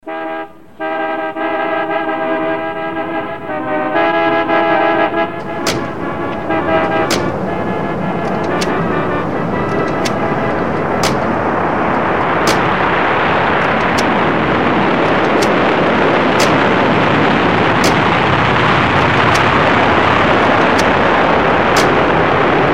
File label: street noises